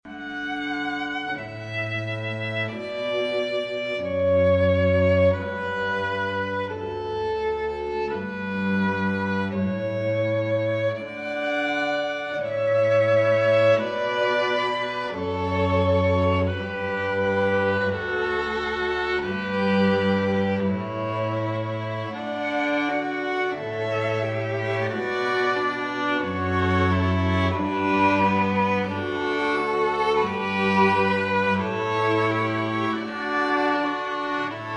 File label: strings; pachelbel